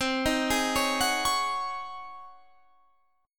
G sharp 11th C

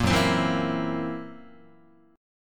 Gb 7th Flat 5th down, Guitar; strummed; JGuitar

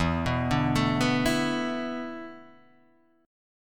E 7th Suspended 4th, Guitar, Drop D

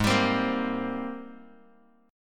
G sharp Major 9th G sharp, Bass Standard; G#M9 strummed; JGuitar

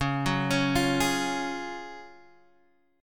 F sharp Major 7th Suspended 2nd C sharp

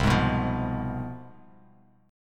C Major 7th Suspended 4th Sharp 5th, down; CM7sus4#5 strummed; JGuitar

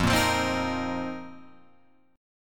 F 7th Suspended 4th F down Guitar Open F; strummed; JGuitar

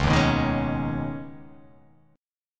C sharp 7th Suspended 2nd; down; chord shape

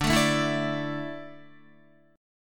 D Suspended 2nd D down Guitar; Dsus2; strummed

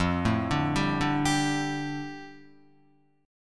F 6th Add 9th F down Guitar, chord; F6add9 arpeggiated

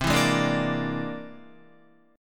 C Augmented 9th, Guitar; C+9 strummed; JGuitar

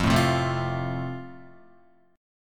F Major, Guitar; strummed

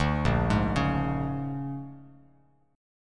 Bb 7th Sharp 9th Bb, Bass Standard; Bb7#9; arpeggiated; JGuitar

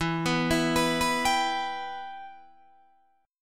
E Minor E, Guitar, DADGAD

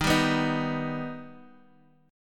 D sharp Augmented D sharp Guitar; D#+ strummed; 10,10,11,8,x,x; JGuitar

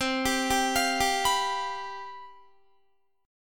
C Major 7th Suspended 4th, down, Guitar

